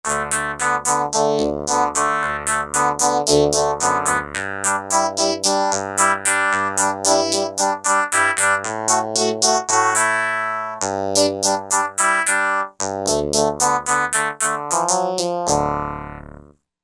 2 voices; Korg MSC-12S Synth 3

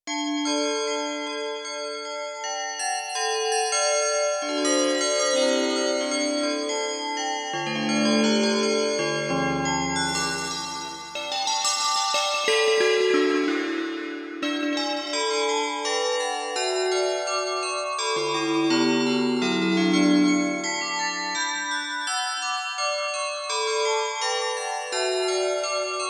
Tubular Bells, Korg Radias-R

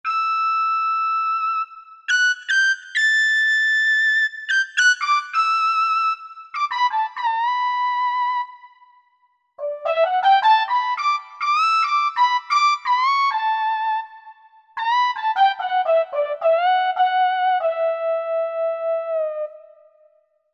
Solo Wind

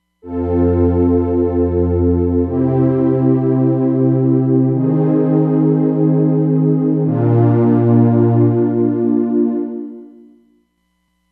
Chorus + Double, Roland DEP-5; Factory Patches

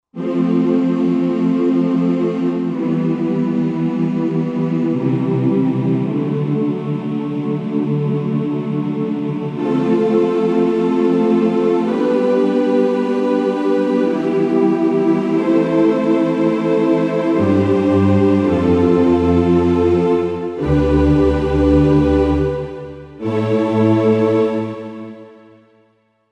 Str+Choir; Roland SR-JV80-09 Session

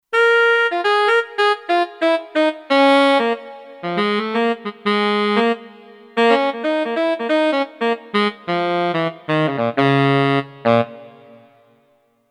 Soft Tenor Sax, Kurzweil K2500X; Factory Programs

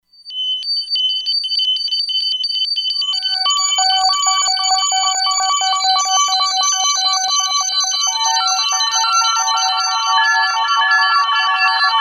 Astral Flute, E-mu Proteus-2; Factory Presets

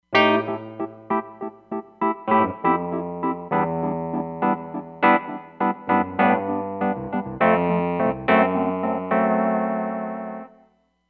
Wurly, Roland Fantom XR

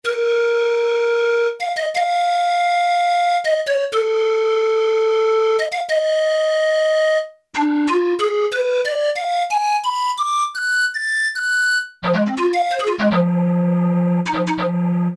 Pan Flute, Kawai K1m; Factory patches - I banks

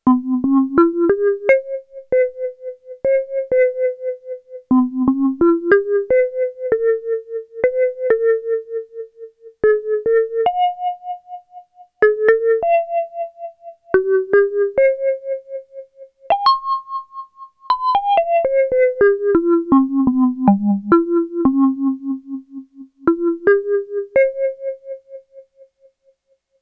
VIBRAPHONE, Roland SH-101; Factory Patches

